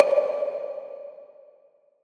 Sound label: Echo Chamber Perc; trap; Percussion; Cymatics; Trap Starter Pack